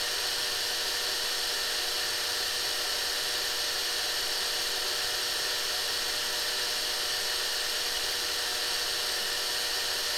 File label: msi flow, noise; fx5800ultra2